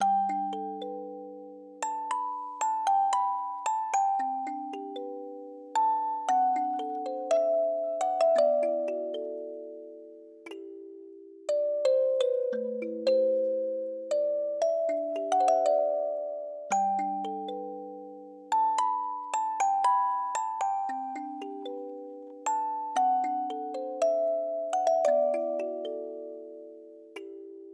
Love Song (Music Box)

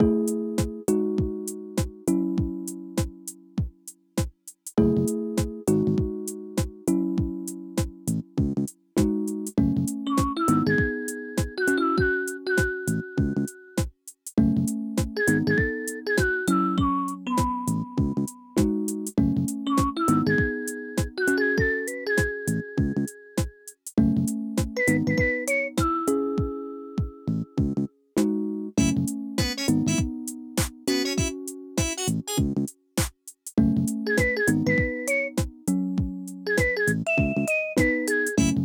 Aircheck, Retro

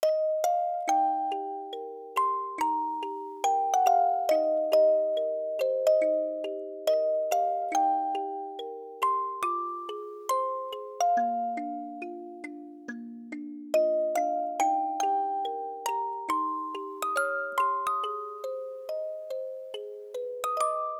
Dixie (Music Box)

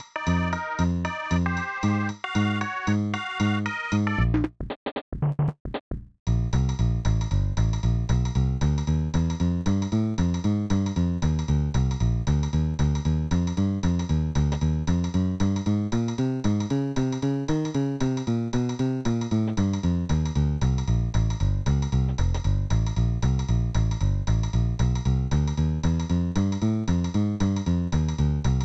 TV Program – Performance, Jazz, Instrumental

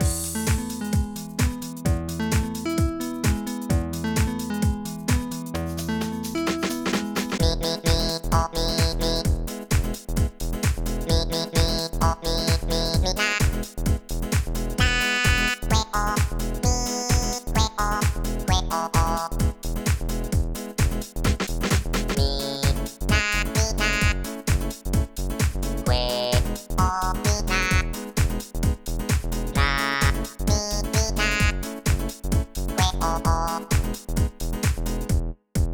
Synth, Remix

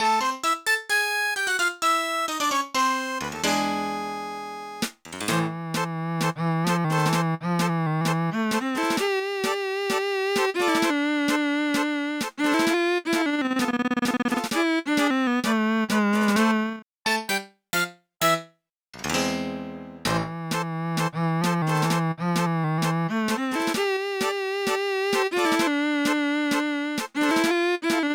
Tango (Aircheck, Hi-Fi)

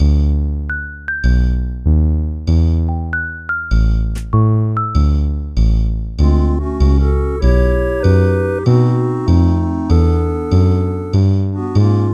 Swing

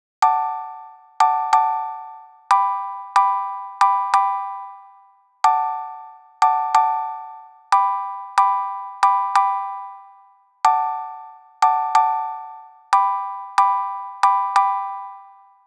Music Box

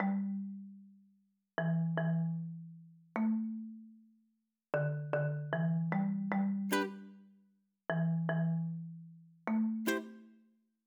3 PM Rainy

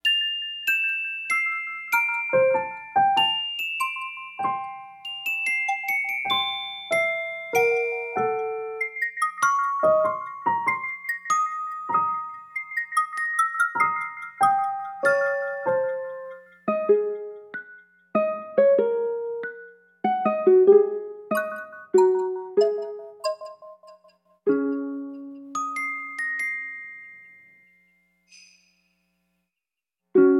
Main Street Day Snowy

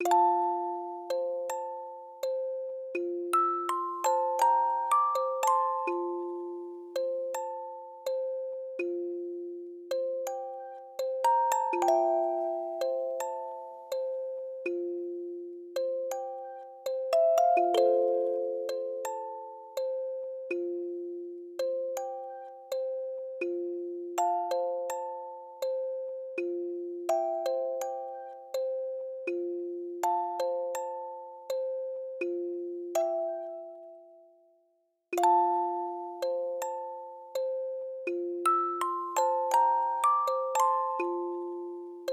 Island (Music Box)

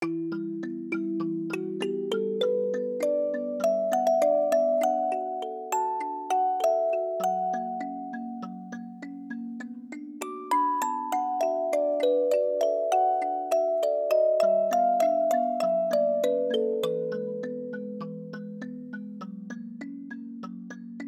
Music Box

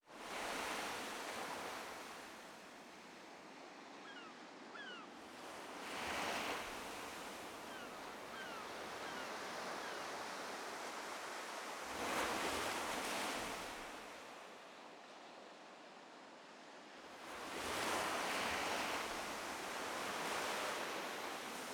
Ocean Soundscape

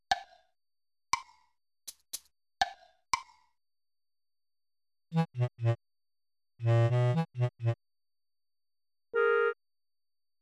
Police Station

